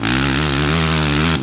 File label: high, Engine; 450